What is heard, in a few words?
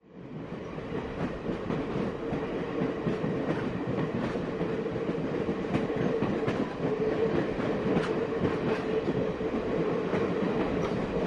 Train On Board Perspe